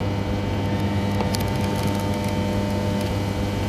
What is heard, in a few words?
Electric Buzz; SFX; IRL Recorded